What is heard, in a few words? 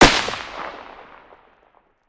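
weapon ar2 suppressed fire player atmo exterior; gmod; viper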